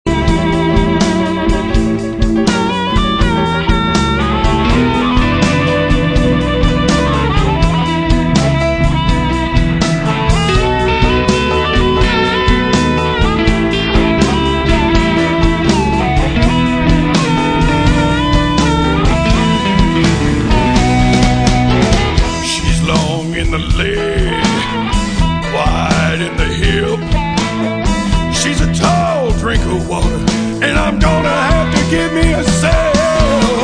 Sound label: Blues Band; Indianapolis